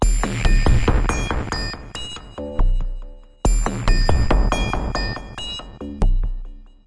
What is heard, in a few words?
opal dissonance; SYNTH AND ELECTRONIC LOOPS